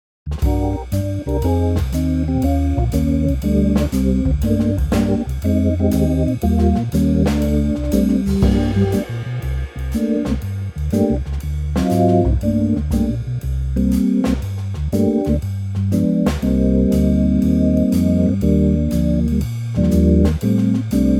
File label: Gm hammond; key - Gm - vocal range - D to D